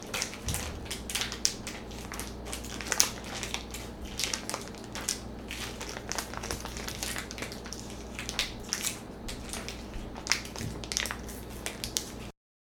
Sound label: Crackling fire